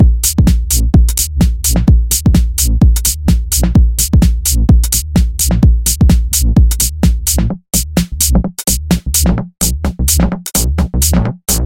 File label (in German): techmaschine